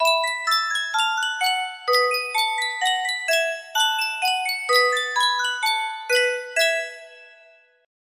sankyo miniature music box

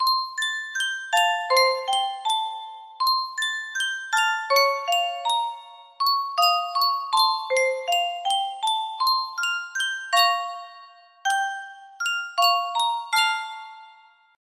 Sankyo music box; final fantasy vii

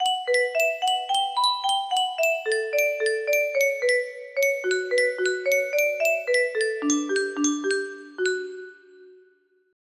melody

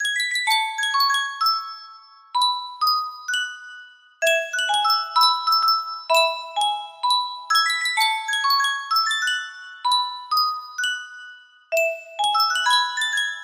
yunsheng custom tune music box

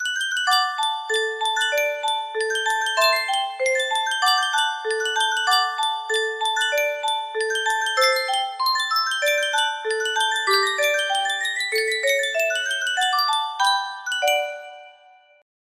Yunsheng music box

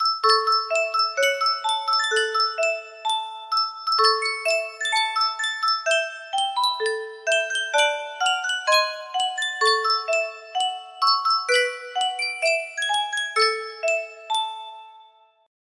yunsheng music box, melody

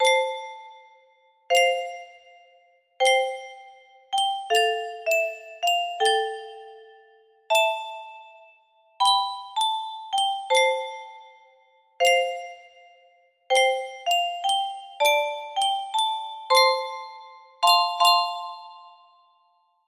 melody, music box melody

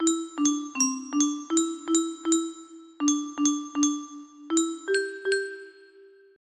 music box melody

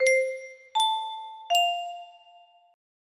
Doorbell, music box melody; snoozers

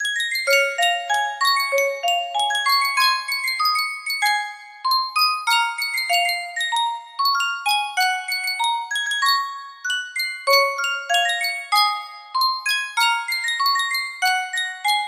music box; yunsheng custom tune

